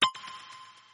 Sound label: points high; celebration Events